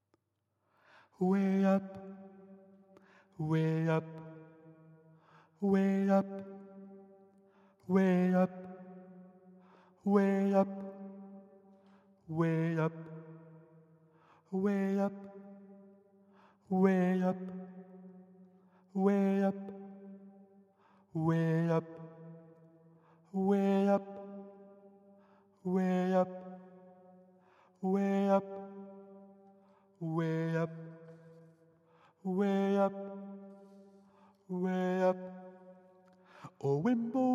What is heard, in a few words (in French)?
SOPRANO; le CD pour le Téléthon 2013